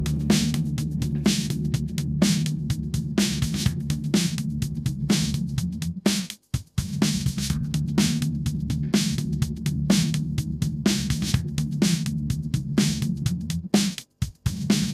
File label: ringtone hiphop